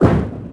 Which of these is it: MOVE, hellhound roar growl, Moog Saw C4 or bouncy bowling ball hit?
bouncy bowling ball hit